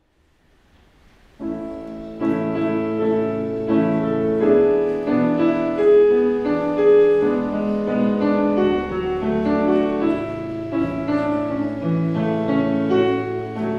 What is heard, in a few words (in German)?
Vorspiel